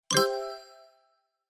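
original ringtones and notifications Nokia Asha 302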